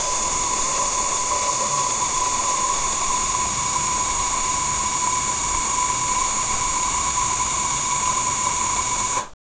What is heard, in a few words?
urinating against the sid, bathroom